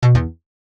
account error

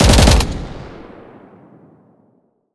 aircraft machine gun; battle

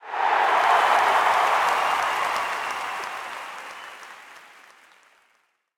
cheer, battle crowds

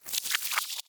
turn, battle

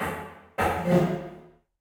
person sit; crazyeights

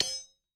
move bishop, chess

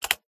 typing

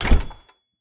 capture, game chess

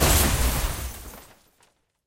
sunk, battleship

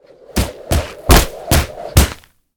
spinning punch; battle

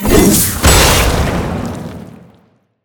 flame sword; battle

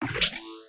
upgrade; lightturret

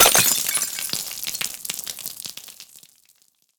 poison bomb; battle

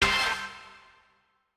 hot dice

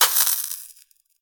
ember; battle

